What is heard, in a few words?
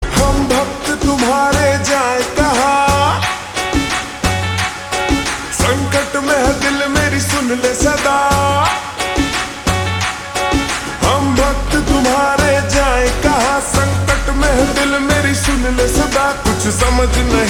Ringtone Slowed Reverb; Judwaa 2